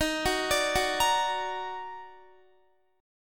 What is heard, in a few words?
Eb Minor Major 7th Eb down Guitar, chord; EbmM7; arpeggiated; JGuitar